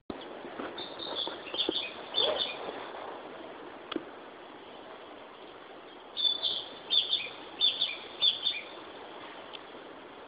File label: Ficedula narcissina